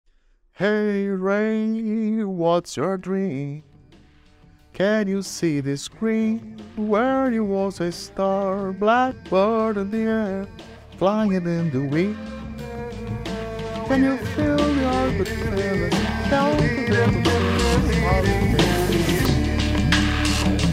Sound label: male english and brazilian portuguese singing